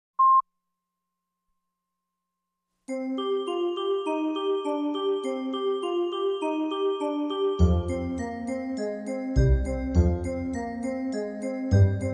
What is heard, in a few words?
SFX Celeste Bass